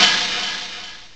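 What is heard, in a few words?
HI-HAT OPEN; CMI Sound Library Rev 1.3; CYMBALS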